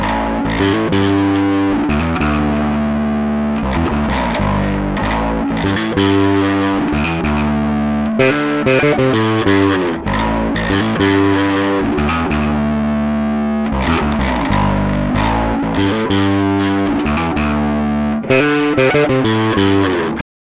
Drive

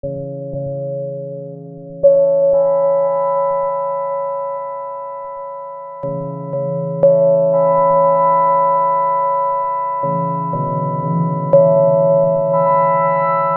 Ambient Bell Moog Modular

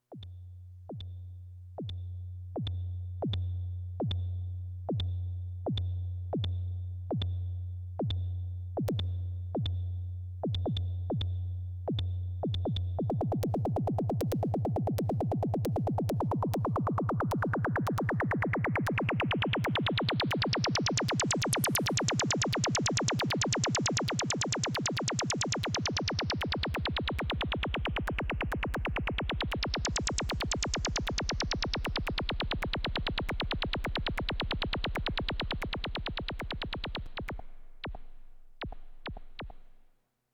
Subharmonicon; BATERIA

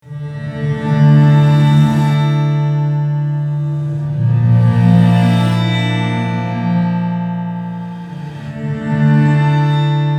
Reverse

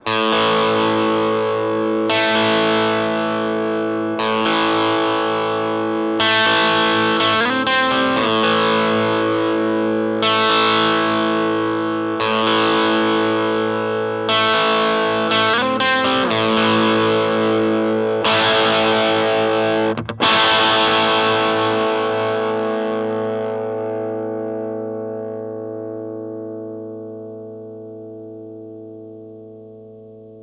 Gibson Les Paul Studio Bridge

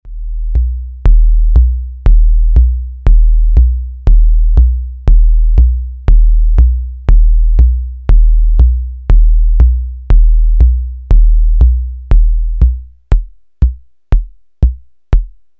KICK

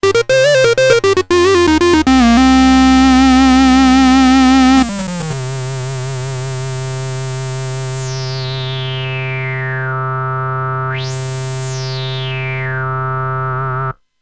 Mini Moog

